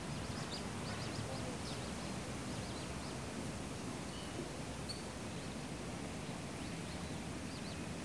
park, ambiances; Cosmic Rage

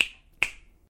snap; CosmicRage

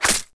on body, Wear

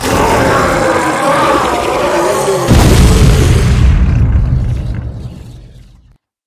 die, enemy, combat; baurg

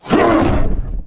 attack, creatures; combat; ryuchi; CosmicRage

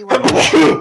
sneeze

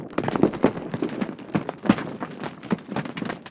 rockfall, volcano harvesting

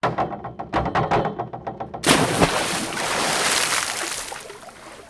pool jump; house; CosmicRage

